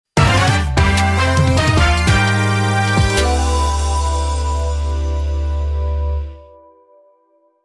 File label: Jingle Land a new catch; sonic frontiers original soundtrack stillness and motion